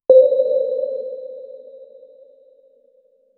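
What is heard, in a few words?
satisfying soft cute button; vanish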